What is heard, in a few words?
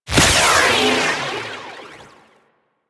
Marker C, UI; DE; Warframe; Parazon